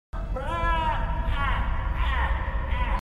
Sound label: Joker laugh; Leto